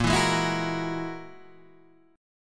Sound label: Ab 7th Suspended 2nd Sharp 5th, chord